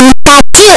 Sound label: pikachu cry; pokeyellow